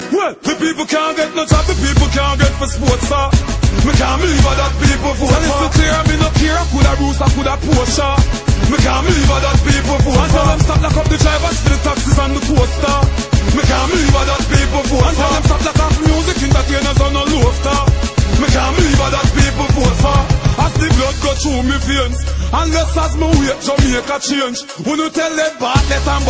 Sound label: dancehall stage mix